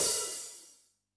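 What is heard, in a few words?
hi-hat open, Yamaha DD-65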